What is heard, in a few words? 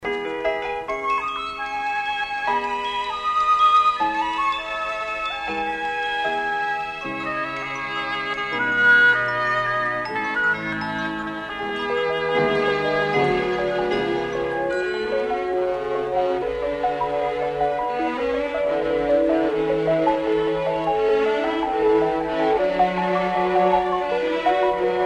Instrumental; Piter Pjen